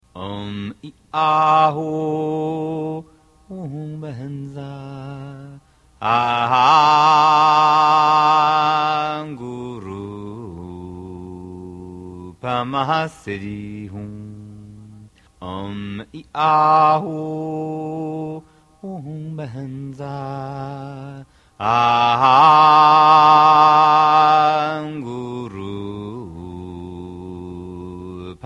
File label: Medium Padmasambhava Mantra